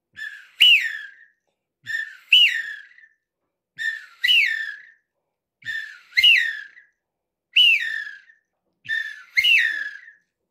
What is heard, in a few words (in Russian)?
свиязь селезень